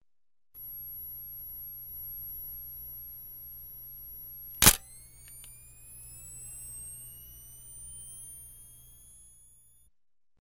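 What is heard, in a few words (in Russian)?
щелчок и зарядка вспышки фотоаппарата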